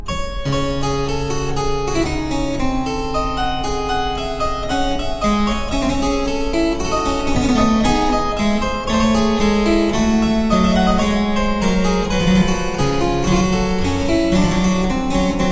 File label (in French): suite pour clavier, allemande; BACH; n1 Bwv 812